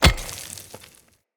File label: Hammer Impact Dirt; Weapon Impacts; Player; Environment; Tuvalkane Hammer